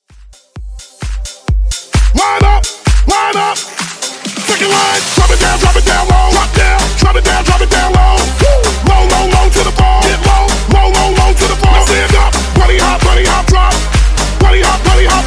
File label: Funk Rattle Bootleg 130Bpm